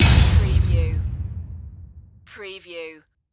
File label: Hit Metal